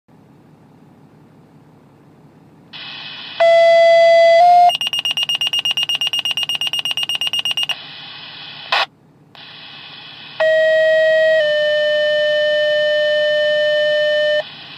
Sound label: Fire Pager